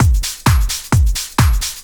drum 130 trance

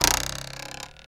RULER; Rarefaction; Poke in The Ear With A Sharp Stick

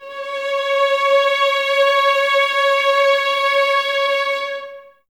C#5, 11 Violins, Soft; Miroslav Vitous

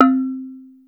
woodbell, Yamaha Motif ES drum machine; 300 Drum Machines; Yamaha Motif ES-Series

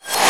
GLASS, REVERSE; AKAI S-Series CD-ROM Sound Library VOL-1

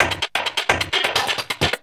Beat, Industrial

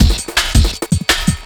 Beat, Drum N Bass

